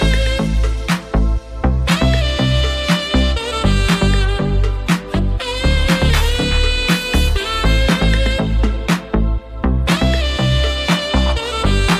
Very Romantic Ringtone

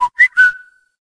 Samsung S20 Ultra Notification Ringtone

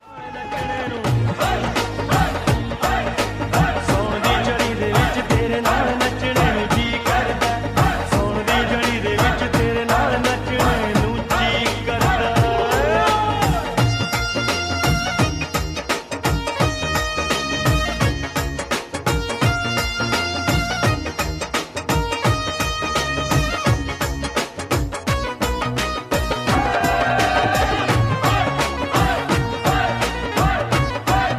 Punjabi Song Ringtones

